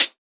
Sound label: Closed Hat; FLPRO